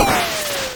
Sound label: Icicle Fall; SMM2; SMB